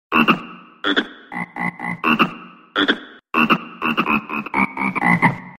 Cute Cucaracha Frog

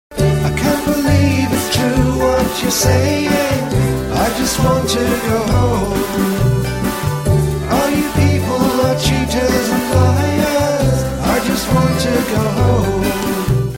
Soft Music

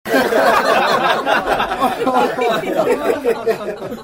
Laughter Of Men And Women

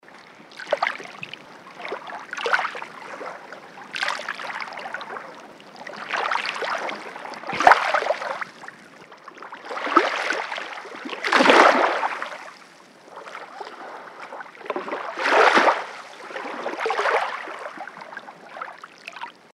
Floating Car

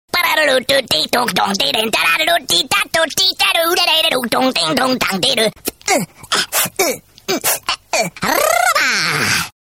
Frog

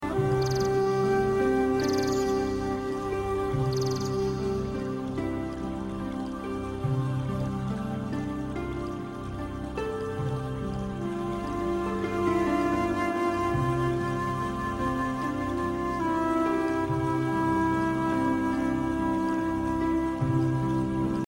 Birds Chirping

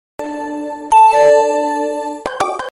Spacey Chord Sound

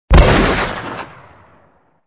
Pistol Shot

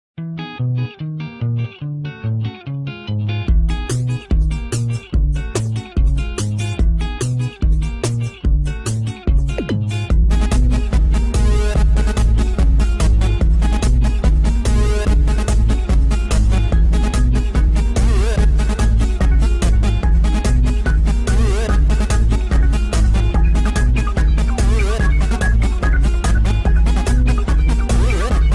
Android Ringtone

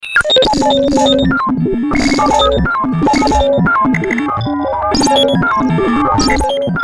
Digital Vibration

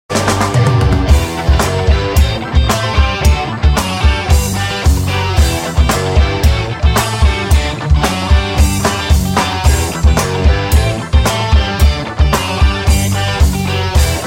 Happy Music

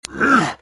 Ogre

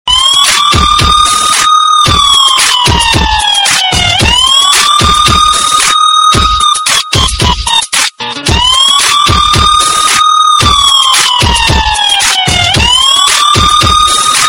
Siren Siren

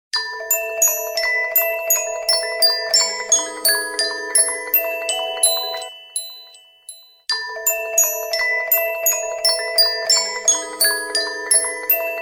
Soft Bells

